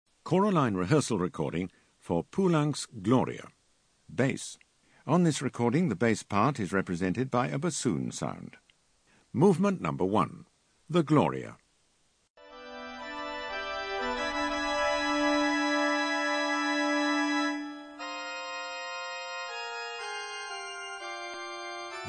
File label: Bass; Poulenc Gloria